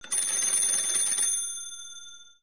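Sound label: black telephone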